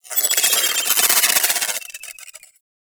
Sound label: Pulse Projectile By; Warframe; Warframes; Gyre